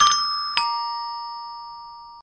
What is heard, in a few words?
ding dong bell doorbell